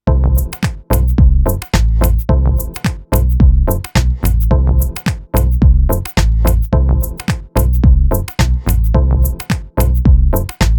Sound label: Loop